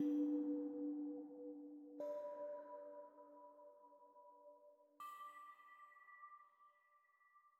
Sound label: Bells; Citadel Bells New